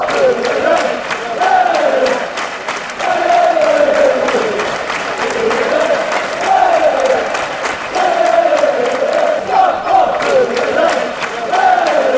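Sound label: dedede scunnny, football chants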